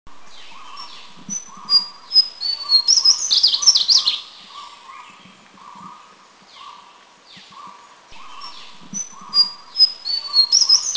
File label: Slaty-bellied Tesia Song; Thailand